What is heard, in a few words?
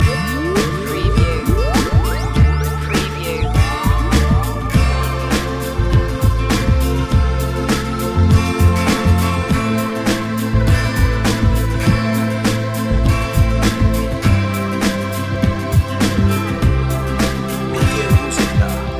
easytronica